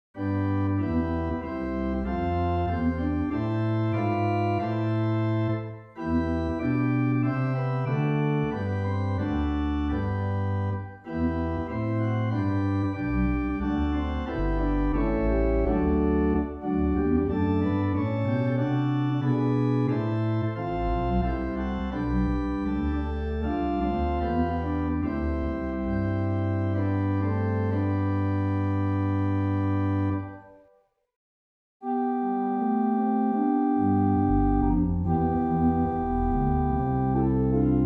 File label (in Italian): organo; Clasica